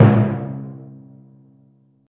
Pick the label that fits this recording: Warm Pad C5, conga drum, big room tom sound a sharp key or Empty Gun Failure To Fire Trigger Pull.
big room tom sound a sharp key